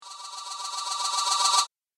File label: Buzzy Metal Insect; Alien Sound Effects; Andrew Fleming